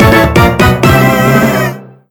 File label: BGM Title Logo